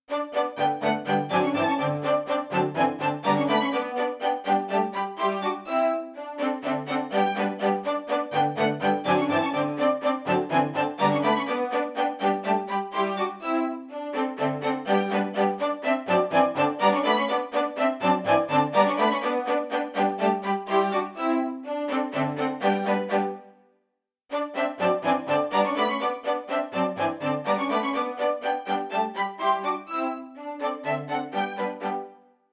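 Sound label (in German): Contretanze fur zwei Violinen und Bass; Zwolf Contretanze; Tanz XI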